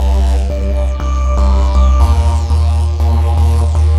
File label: Swirl Synth, Synth Textures; Sonic Foundry (Sony Creative Software); The Electronic Music Manuscript Collection